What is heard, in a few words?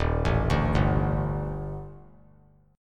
D sharp Major 7th Flat 5th D sharp, chord; arpeggiated; JGuitar